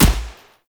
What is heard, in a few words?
Assault Rifle Fire; weapons; Warframe; Grineer